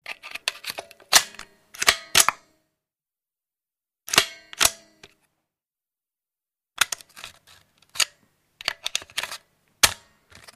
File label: Gun Reload Mechanis, handgun mvmt